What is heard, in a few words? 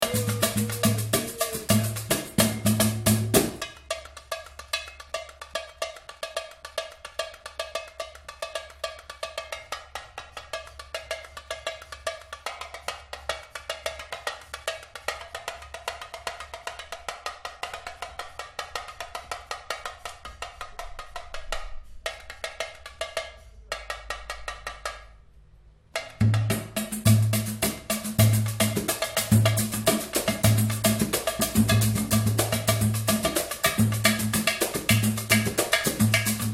tamborim